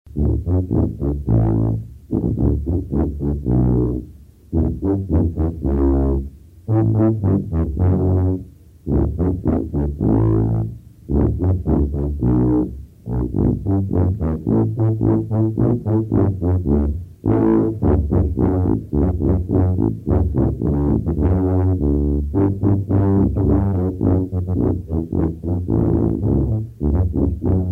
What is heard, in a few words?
Jingle